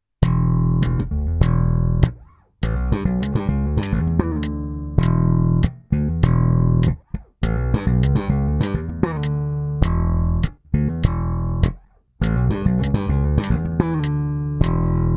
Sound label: Slap fill in E